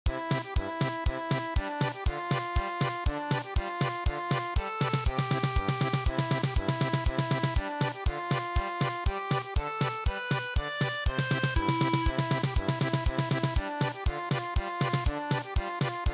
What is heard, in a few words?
German Polka, traditional; midi